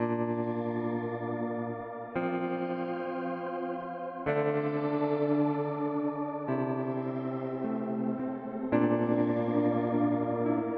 Electro Harmonix Oceans 12 Shimmer Tremolo

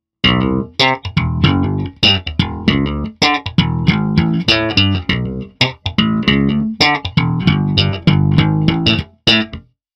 Mayones Jabba 4

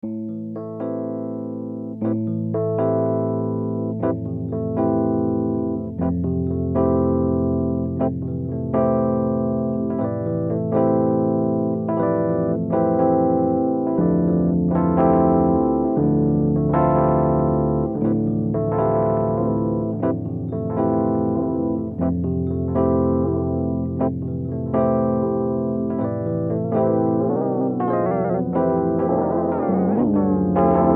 Superego plus Delay rhodes

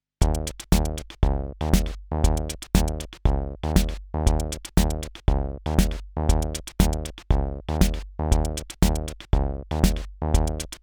Groovebox flair high; goldmike mk2

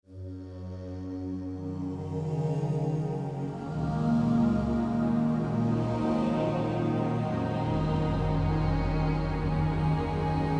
KORG Wavestate MkII Vox Violin Factory Sound

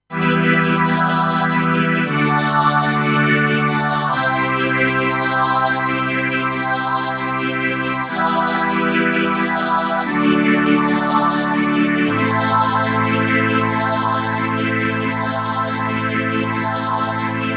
Vintage String Ensemble, tc electronic Helix

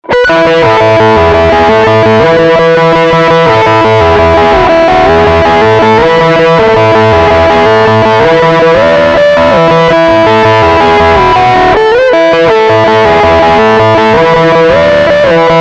Neck Pickup

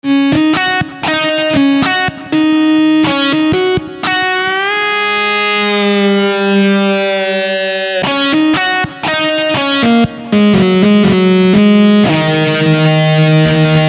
Roland AX Synth Searing Guitar

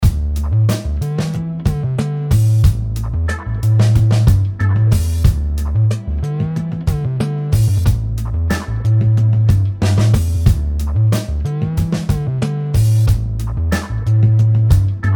Effects Bass

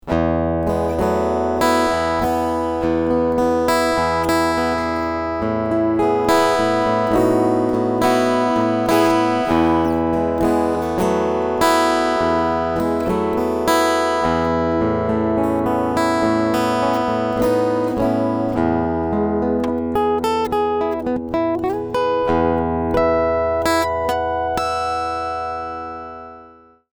Picking EQ Full

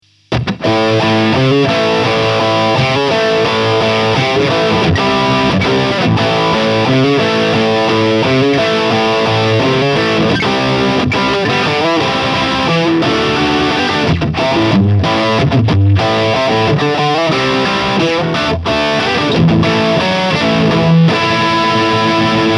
Classic Stack

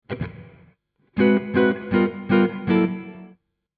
Guitar Chords; GRM

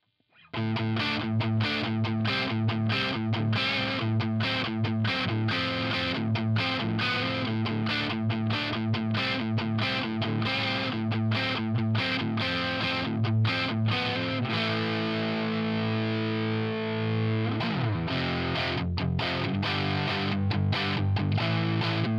Jackson SL2 neck DIST

